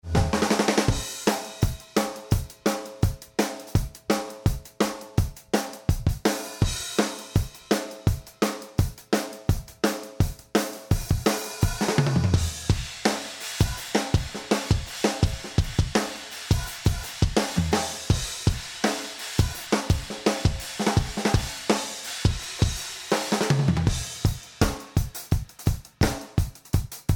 Drums Plus mpressored Snare bypassed

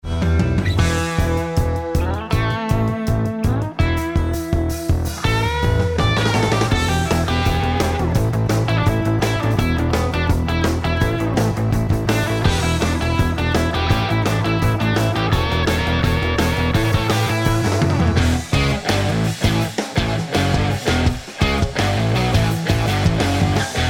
Bass active; Mix Plus karacterised Bass

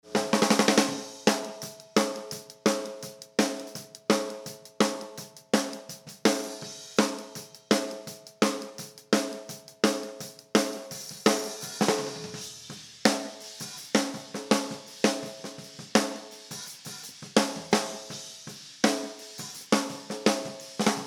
mpressored Snare bypassed

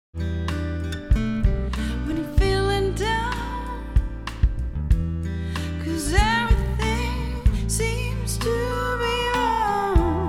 alpha mix buss pop track bypassed

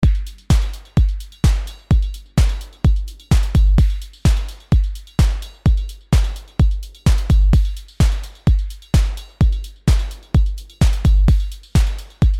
Drum Loop bypassed; xpressor

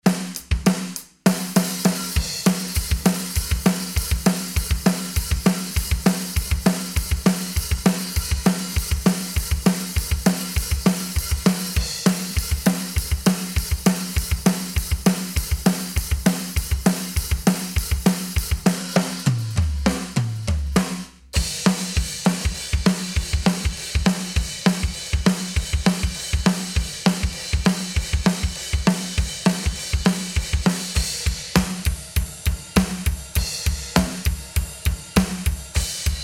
Drum Buss active

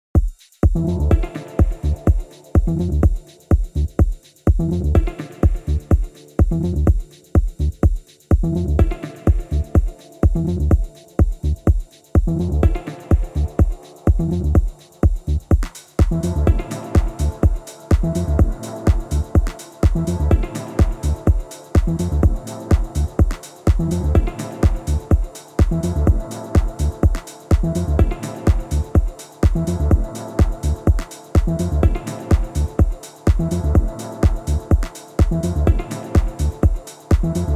Mix Buss Techno bypassed; xpressor

